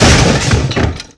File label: wall break; svencoop; keenhalloween